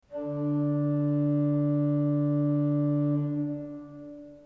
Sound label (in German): ton, orgel